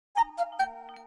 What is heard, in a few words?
Notification Ringtone